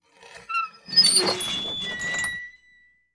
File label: chain door; The Sum of All Fears